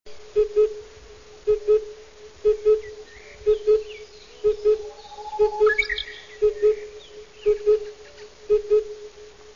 Kukułka wschodnia - Cuculus saturatus

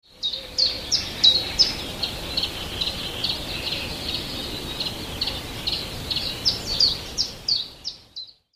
pierwiosnek, te sylaby występujące u pierwiosnka; wroblowe; zapozyczenia

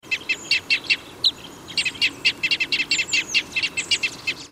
krzyżodzioby świerkowe

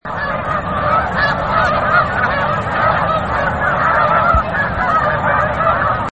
gęsi śnieżne; śnieżyca

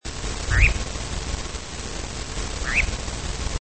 lelek; wołanie

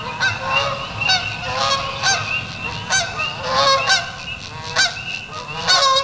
bernikla kanadyjska

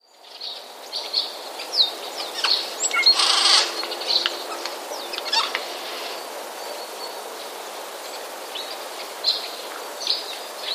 sojki; swoisty szczebiot sójek

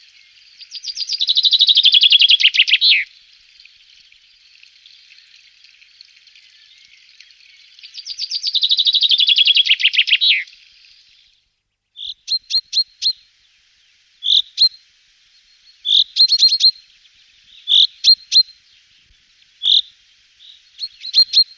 zięba - śpiew i nawoływania

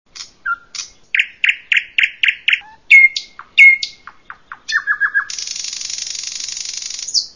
słowik szary - jedna zwrotka; luscinia; wroblowe; turdidae

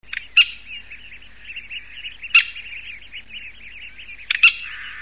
kurka wodna z pisklętami

